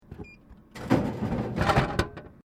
Vending Machine Drink Dispenced, Japanese vending machine; Machinery (Sound Effects:Machines)